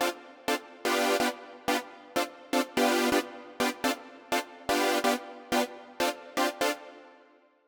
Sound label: Synth, 125bpm Dm, Nu Disco; Zero-G; Construction Kits; Speedy